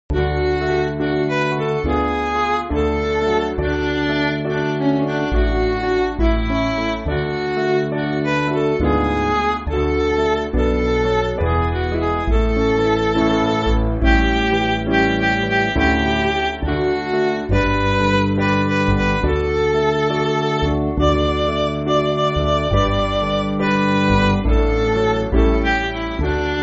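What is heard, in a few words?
Midi, Music Backing Files for Churches; More Holiness Give; My Prayer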